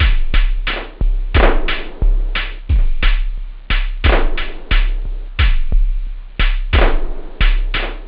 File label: acid, techno; OctaMED SoundStudio V1